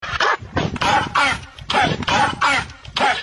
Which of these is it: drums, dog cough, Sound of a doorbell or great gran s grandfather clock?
dog cough